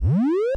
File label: alert